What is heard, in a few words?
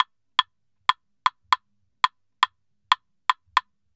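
CLAVES SALSA, salsa drums and percussion; Best Service ProSamples vol.14; AKAI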